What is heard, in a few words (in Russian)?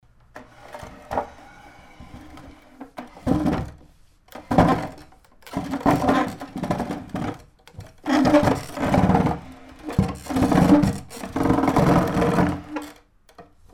dvigaem stol